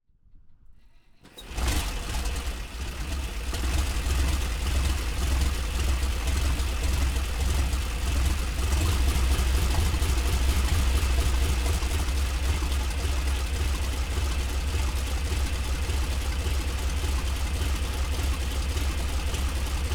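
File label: fokker d vii onboard start idle low to high RPMs off engine; 1918; DPA4062; Pole Position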